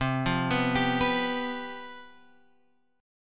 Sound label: C Major 7th Suspended 4th, down, Guitar, Open G